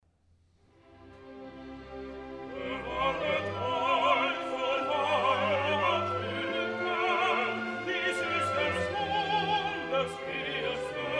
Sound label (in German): Duett - Sprecher Zweiter Sprecher; Mozart, Wolfang Amadeus; Opéra - Die Zauberflöte